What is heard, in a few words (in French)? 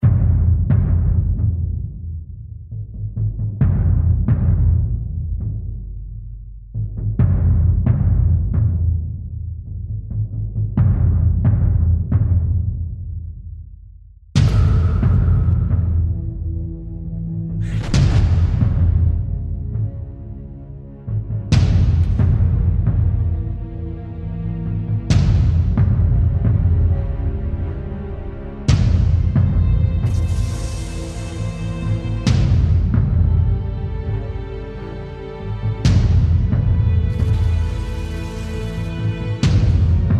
SERIE DRAMA ATTENTE